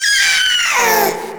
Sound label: Inked Humanoid Attack; Warframe; Shadowgrapher